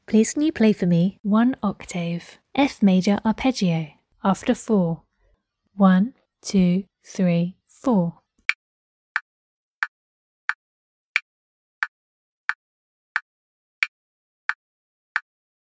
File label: f maj arp